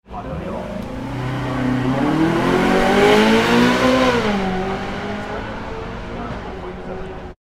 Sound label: Ferrari California